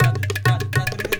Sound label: INDIA L; Spectrasonics - Heart of Asia CD2